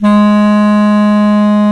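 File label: Bass Clarinet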